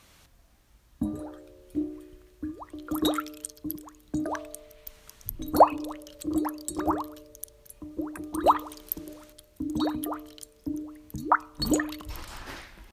PVC Pipe Leak Heard With Deck Plate Through 8 Slab